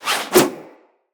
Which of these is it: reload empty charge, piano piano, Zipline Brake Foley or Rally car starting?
Zipline Brake Foley